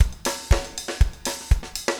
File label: 4 on floor 120, loops, 120bpm